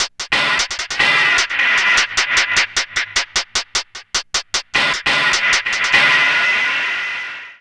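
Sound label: NAKD hi-hat, drum loop; Big Fish Audio; Uncivilised G'rooves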